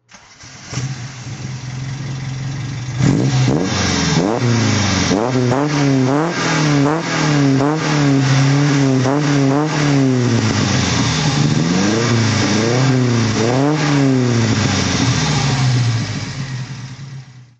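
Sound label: alfa romeo gtv 2000 throttle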